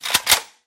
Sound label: saiga switch, guns